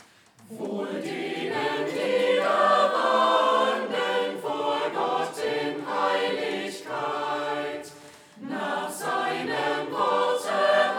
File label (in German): Chor; Wohl denen, die da wandeln...; Chor der Ev.-Luth. St. Johannesgemeinde Zwickau-Planitz